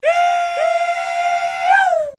eeeyah male, voice; ooh ahh

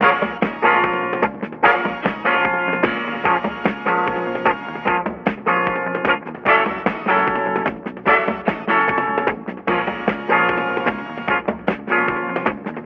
horns and guitar move faster, phonky loops

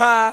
"ha", Voice

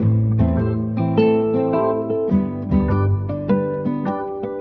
guitar, Loops Phonky

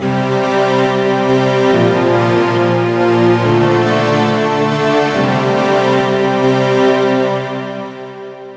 keys, Loops, Sad

